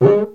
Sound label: Cuicas; musicradar; cartoon caper